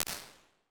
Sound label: Drum Wood Plate; Made for Drums; Ableton; Convolution Reverb